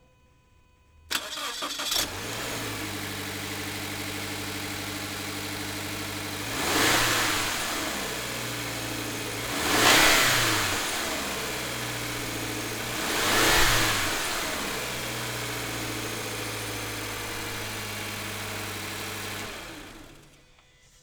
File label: toyota 86